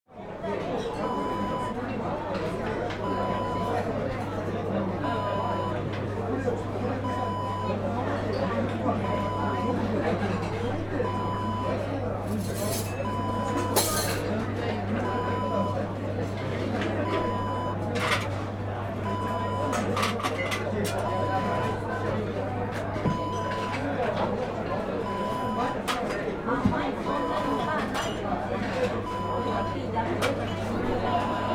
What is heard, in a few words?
restaurant